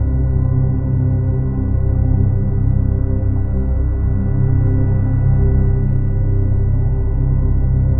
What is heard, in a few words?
Drone Ambient; Drums & Drones